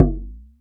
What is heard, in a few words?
TURK MID; AKAI S-Series CD-ROM Sound Library VOL-2